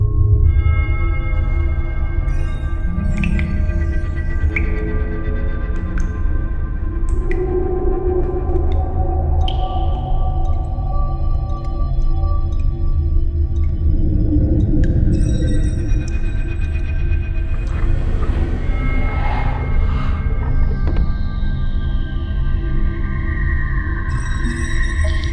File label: Ambient, The Teensie Temple; rayman 3 hoodlum havoc gc ps2 windows xbox 2003